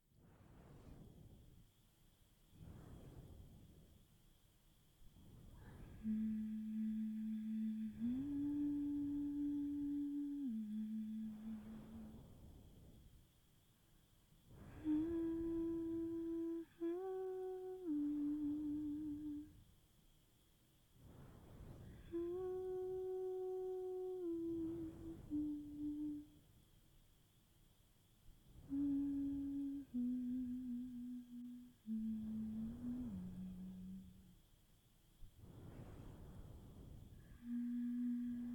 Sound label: Humming, Brushing, Crickets, Relaxation, ASMR